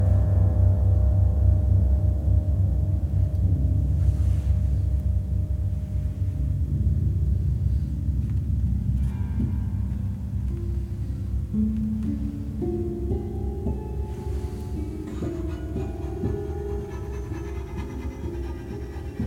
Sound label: Sorta Tense